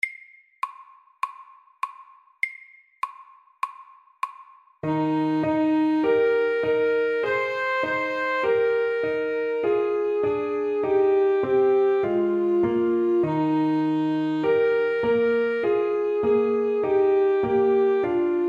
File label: Allegretto, 100